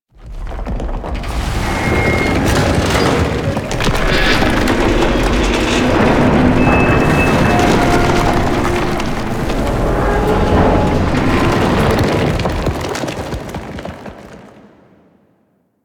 Man In The Wall Foley; Entrati Labs

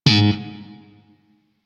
Shawzin Slap Oct Two G Sharp, Chords; Music; Warframe; Sentient Shawzin

